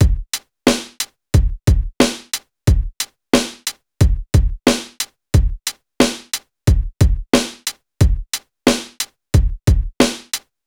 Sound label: Hiphop loop